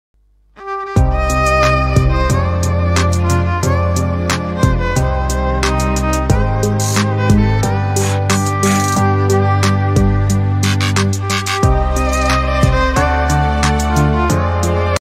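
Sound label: instrumental violin ringtone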